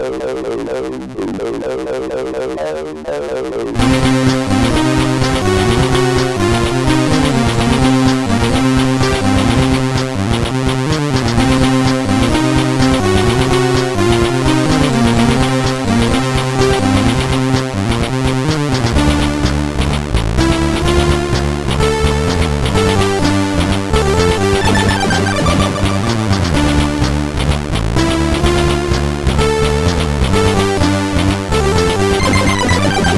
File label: Music Samples, sid, CSG8580R5